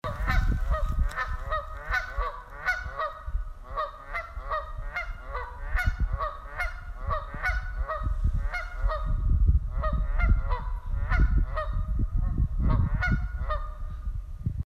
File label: Canada Goose; jim brighton